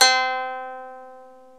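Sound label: ethnic plucks; Eth Plucks, Pluck Duo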